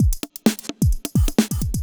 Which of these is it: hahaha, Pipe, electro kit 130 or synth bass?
electro kit 130